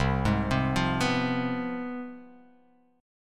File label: C Major 7th Suspended 4th Sharp 5th, down